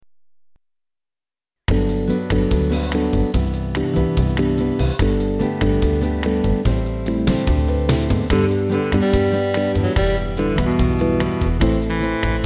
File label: Jazz